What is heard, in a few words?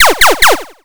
pew pew